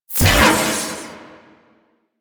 Proj Fully Charged Whoosh; Warframe; The New War; Quests; Weapons; Omicrus

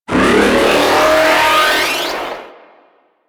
Split Laser Open; Warframe; Corpus

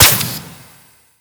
Jetpack Thruster D; Warframe; Corpus Jetpack

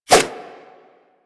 Nunchuck Whoosh; Warframe; Infested

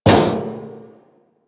Physics Barrel Impact Medium, Metal Barrel; Physics Objects

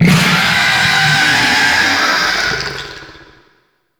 Raknoid Scream; Venus; Warframe; Veilbreaker; Kahl

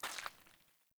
Grunt Boot Walk Water; Characters; Enemy; Melee Grunt; Footsteps; Boots; Sinecure

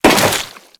Crewman Step Goo, Footsteps; Warframe; Corpus

